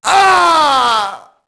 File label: mobster death; Max Payne